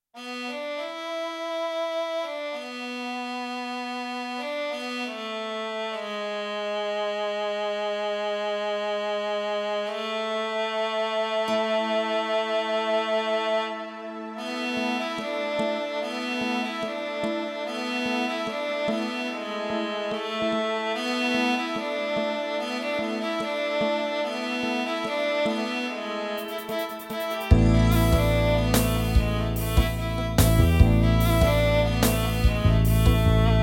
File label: Esraj